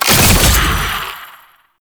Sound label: Fuel Projectile Super Pick Up; Warframe; Jade Shadows; Ascension